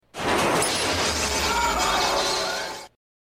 control trains

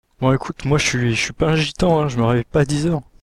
zzzzzzzzzzz

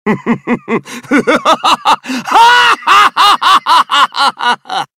laugh

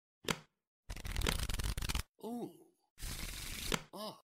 shuffle

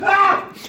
yell

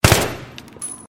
aek 971 burst indoors

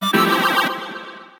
percussion shell lootbox sfx; discord

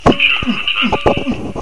beatbox

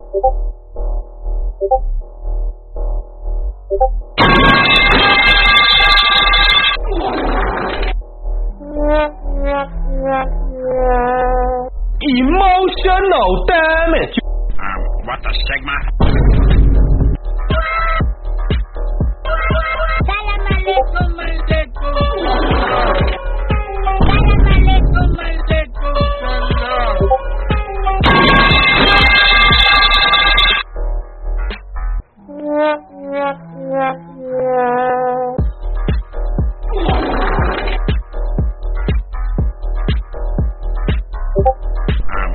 phonk; meme

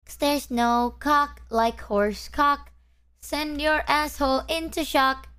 horse

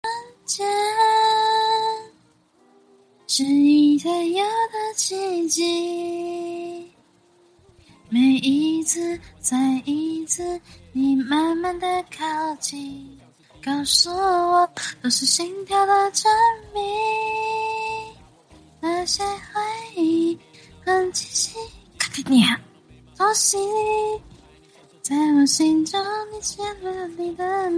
heartbeat

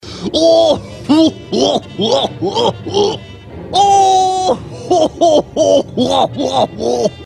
shark laugh

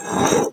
plate slide

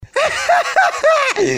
uhahaha; tem cafe

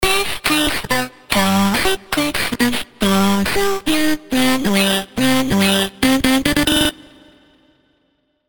horror version; mr fun computer; sprunk retake

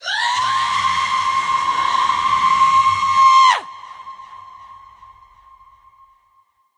banshee scream

